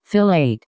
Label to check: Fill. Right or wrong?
Right